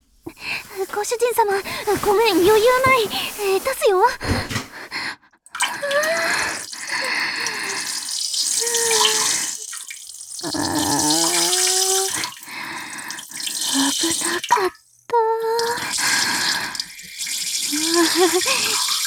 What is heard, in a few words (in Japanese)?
今日のおしっこ 決壊寸前おトイレ